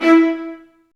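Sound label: Marcato, Strings; Vlas Marcato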